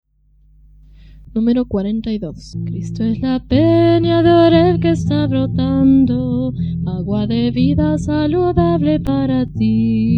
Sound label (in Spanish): Coro, himnario niños